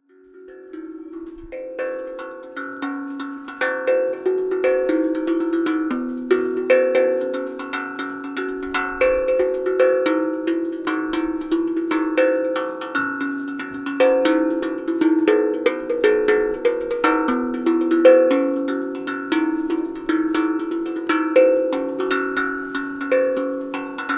An Instrument the Boy Called Happy Day Gokarna, Field Recordings from India and Nepal; Samuel Corwin; Selected Field Recordings from India and Nepal Volume I Folk Songs